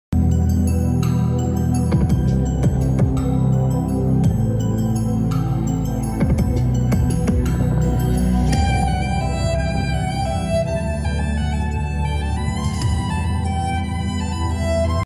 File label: carol of the bells violin cover